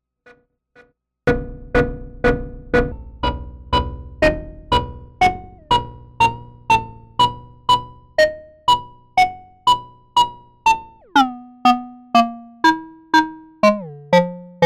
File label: sample as an oscillator; time domain processor